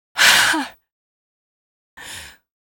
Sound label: stalk breath; hl2; ep1; citadel